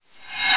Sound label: Reverse Cymbals; Vengeance - Essential Clubsounds Vol.5